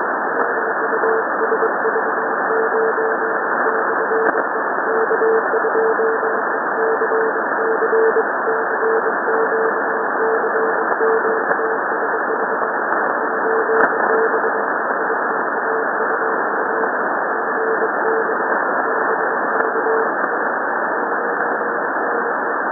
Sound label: sending random prefixes